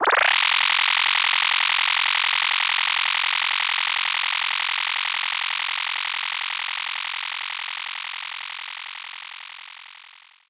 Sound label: Rankup Gauge Up